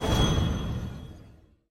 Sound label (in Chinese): 稍后处理 卡牌回收; nba; 球探系统